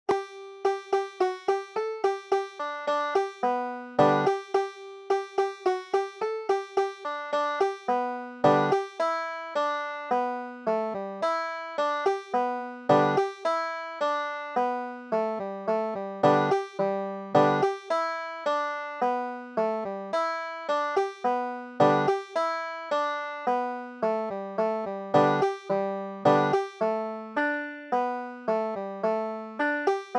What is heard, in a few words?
banjo